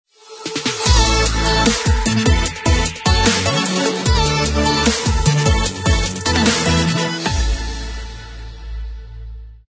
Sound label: Future Bass Logo Short